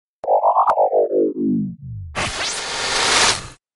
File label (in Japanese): モンスター召喚音; 遊☆戯☆王